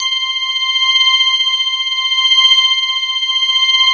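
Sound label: ORGAN, left; East West - Phat & Phunky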